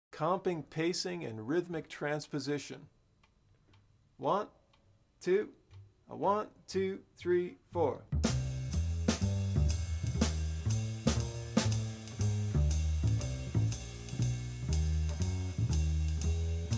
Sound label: Rhythmic Transposition, Bop Drumming; The Art Of Bop Drumming; Riley